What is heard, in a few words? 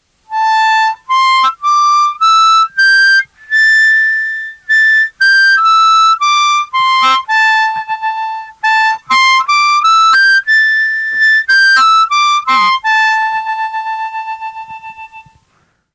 pent am